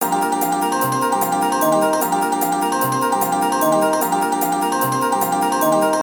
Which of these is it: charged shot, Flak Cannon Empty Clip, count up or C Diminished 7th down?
count up